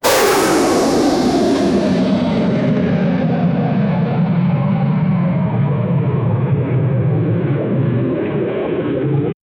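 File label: aircraft jet turbine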